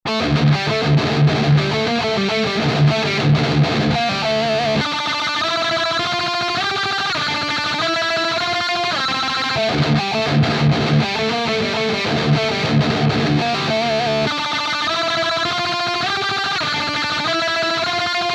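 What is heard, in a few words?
guitar axe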